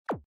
laser subtle low no kick

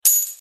Coin Bonus

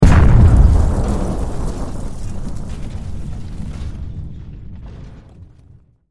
explosion debris, Structure Explosion; Bluezone

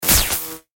electrical fuse burst

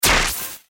electrical fuse burst

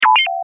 sci fi electronic

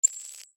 subtle tech interface

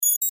subtle tech interface

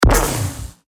particle canon

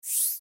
min max up

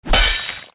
sword strike body stab

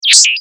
scifi electronic

